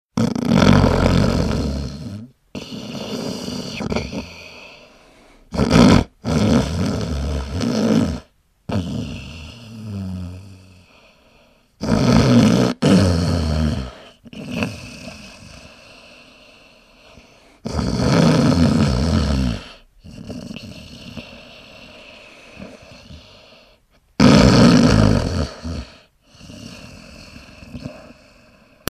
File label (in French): ronfle